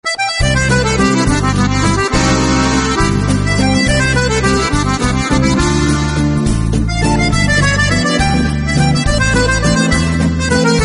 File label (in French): accordeon romantique